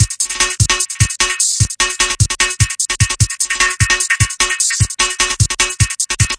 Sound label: 150 cicada break, drum loops and breaks; 141 to 160 bpm